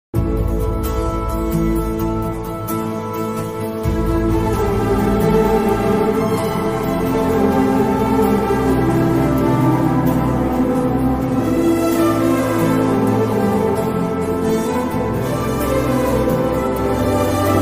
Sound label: radheshyam bgm ringtone love bgm